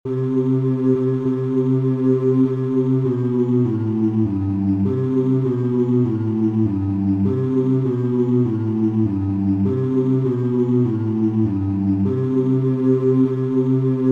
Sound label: Fanfare